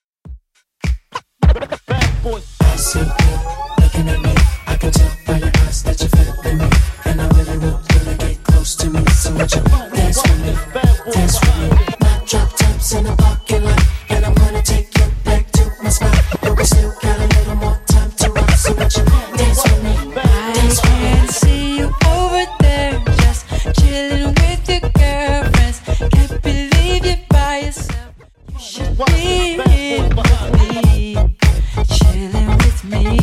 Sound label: Throwback Rnb DJ Friendly 102bpm